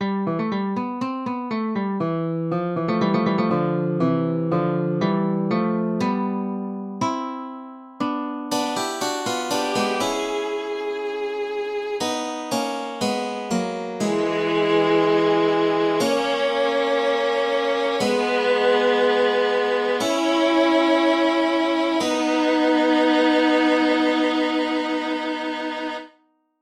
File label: Western Love Song